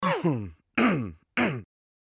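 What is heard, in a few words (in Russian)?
Поперхнулся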